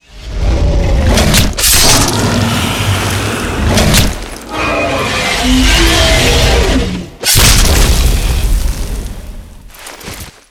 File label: kill move dragon rodeo slash; skyrim